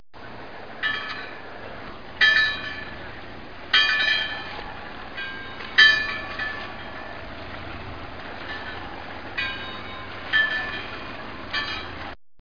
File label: buoy